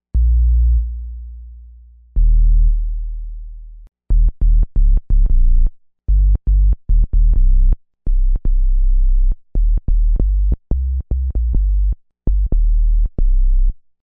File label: basses